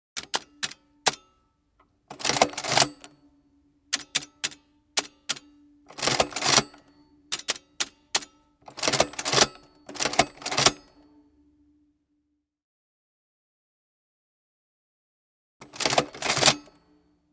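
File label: typewriter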